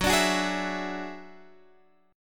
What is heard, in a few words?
Gb 7th Suspended 2nd Sharp 5th Gb down Guitar Drop D